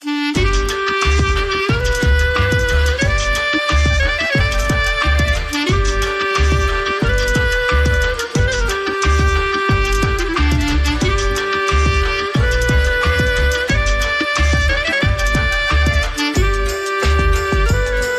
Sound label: Klezmer Drum n Bass; 30sec